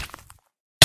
hit sound; minecraft

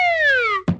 descending whistle and thump

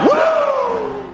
wooo; ric flair

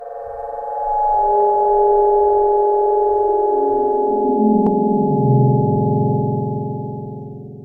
cave sound; minecraft

